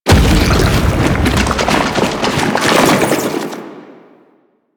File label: Octopede Lash Limbs Slam; Man In The Wall